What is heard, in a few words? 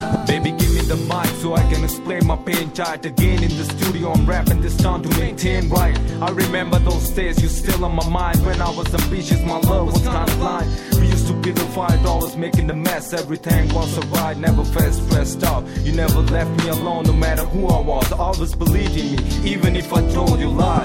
Rap